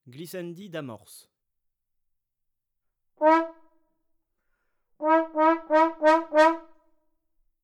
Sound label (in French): glissandi d'amorce, trombone tenor trombone basse; Effets; Effets d'articulation; traite orchestration